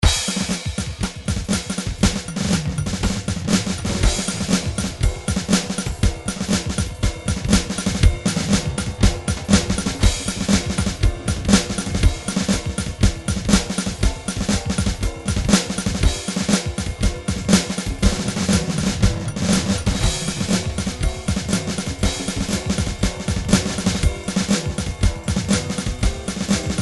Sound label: drum grooves midi loops jazz; Nice Beats 1000